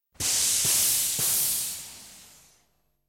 Air Hiss Pressure Re

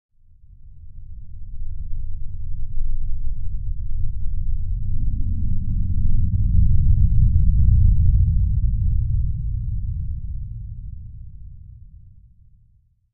LFE Electronic Rumble Wash with LFE

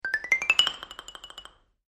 Xylophone Accent Shr

